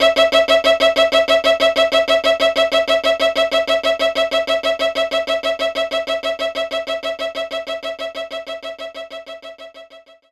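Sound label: Violin Strike E5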